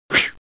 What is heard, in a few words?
swoosh